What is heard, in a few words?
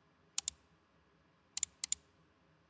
Mouse Click Single Double